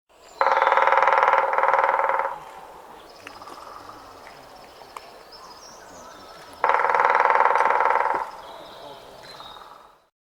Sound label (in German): Grauspecht